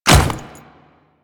Ice Step, Footsteps; Royal Guard

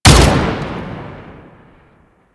Arch Dual Pistol 3D Fire; Weapons; Warframe; Archwing

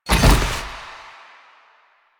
Collision Fast, Motorcycle; Motorcycle Ninety Nine

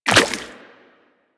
Step Goo, Footsteps Walk; DE; Warframe; Tenno

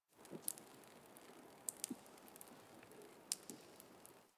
Exterior Water Drip; Warframe; Ambience; Nineteen Ninety Nine; DE

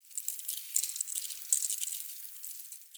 Forest Dry Leave Move; Ambience

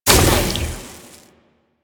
Tentacle Proj Die; Warframe; Proto Infested Ancient; Abilities

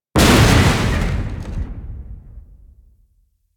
Destruction Explosions One; Ambience; Warframe; Corpus Railjack

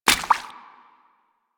Steps Water, footsteps; Warframe; Grineer Royal Jester; Foley

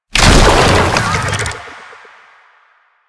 Infested Roll End; Warframe

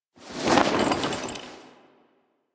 Camp Canopy Flap; Warframe; Quests; Veilbreaker; Kahl Camp

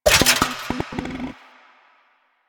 Vending Machine Bottles; Ambience; Props; Warframe; Nineteen Ninety Nine

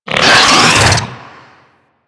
Infested Ancient Pain, Enemies; Warframe

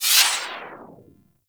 Corpus Modular Skate Stride; Warframe; Corpus Modular Crewman

